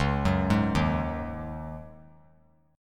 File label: Db Suspended 2nd Suspended 4th Db, chord; arpeggiated; JGuitar